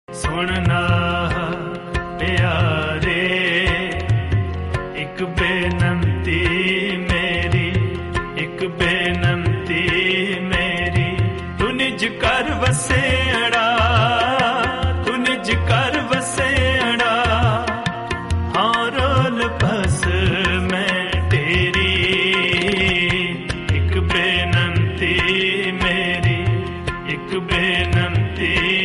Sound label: punjabi dharmik song